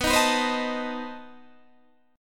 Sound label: B 7th Flat 9th B Guitar Open D